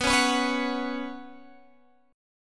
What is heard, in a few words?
B Suspended 2nd, Guitar, Open G